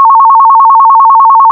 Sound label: phone